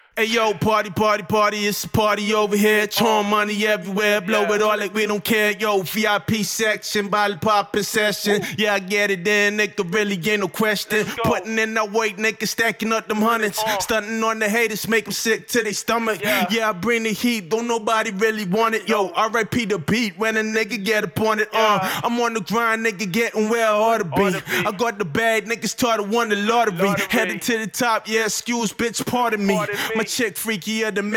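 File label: hip hop 181 A minor, Acapella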